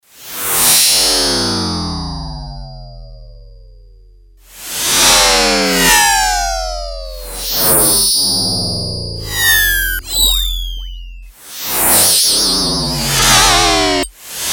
Science, Yamaha TX802; Factory Patches; Voices

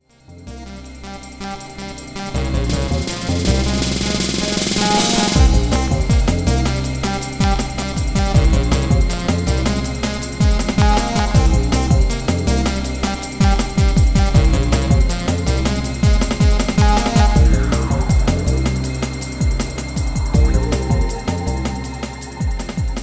Jungle; Generalmusic Equinox; Jungle examples

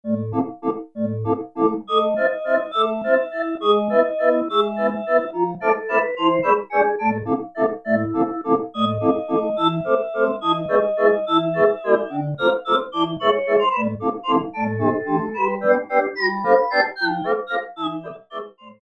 Melodigan, Roland U-220; Factory Patches

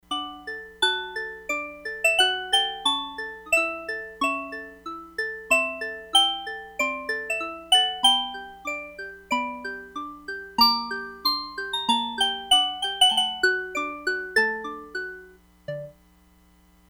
SYNTH BELLS, Crumar Bit 99; Factory Programs

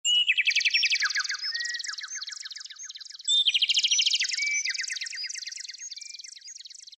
Bird; Roland SC-50 Sound Canvas

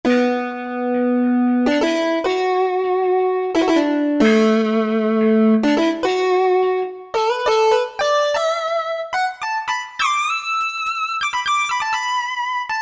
Korg MSC-14S Sound Effects; Iron Man

